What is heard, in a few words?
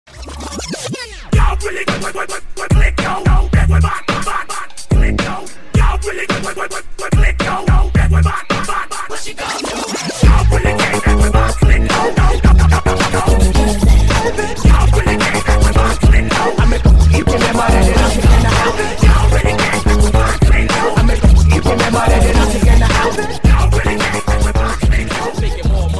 Battle Break